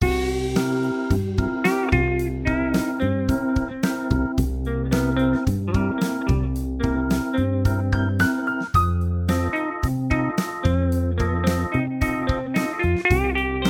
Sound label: bgm; room